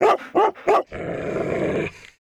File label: Inn Dog; pick N Click Scene; netent